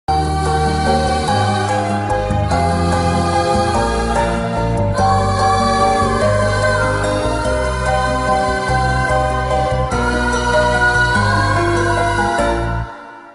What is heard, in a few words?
movie bgm ringtone kannada ringtone kannada bgm; ambari movie